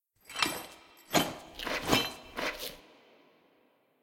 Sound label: sfx br anim walk workout in phase